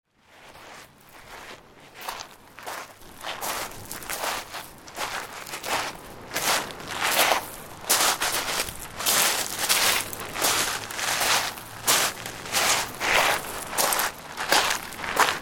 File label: Walking On Beach, Walking on pebble beach near the sea; Footsteps; Human